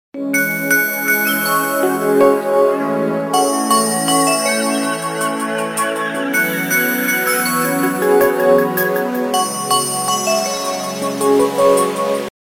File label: SONY ERICSSON SMS TONE RINGTONE